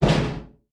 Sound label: recycler jaw shut; Factorio